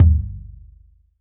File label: pond drop; Hip Hop; Hip Hop Specialty Kit; style kits